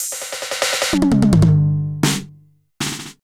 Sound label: Tuned Drum Fill; Sonic Foundry (Sony Creative Software); Electronic Point Blank